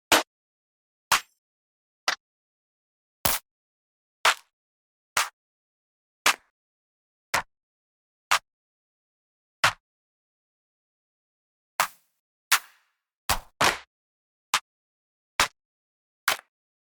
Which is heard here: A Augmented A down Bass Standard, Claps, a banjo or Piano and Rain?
Claps